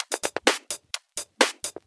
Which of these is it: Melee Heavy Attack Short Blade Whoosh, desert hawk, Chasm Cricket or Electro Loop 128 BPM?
Electro Loop 128 BPM